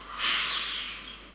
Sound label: Air High, Train; OpenBVE